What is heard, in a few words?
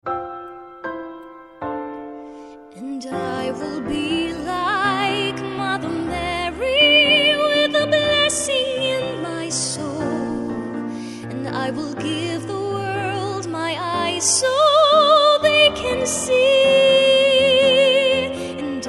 christmas lullaby